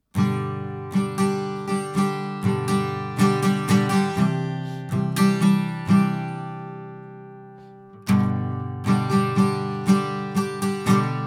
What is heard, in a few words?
Shure Guitar